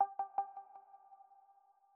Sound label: crash